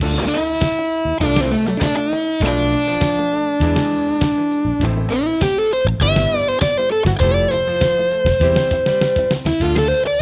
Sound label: solo 335 sparkle drive; ad30tc